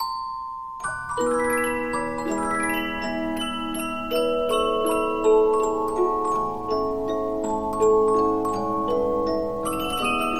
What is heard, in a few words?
Polyphon, 15inch disc musicbox